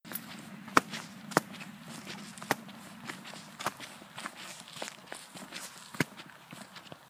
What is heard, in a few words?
walk dirt